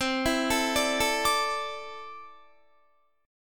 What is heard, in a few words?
C 6th Add 9th C down Guitar; C6add9 arpeggiated